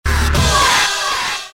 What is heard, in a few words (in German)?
Schlagakzent